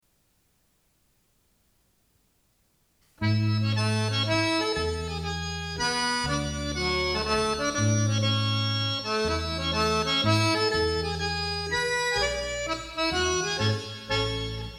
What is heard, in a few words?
Polska